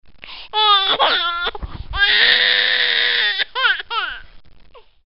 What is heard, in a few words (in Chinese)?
婴儿哭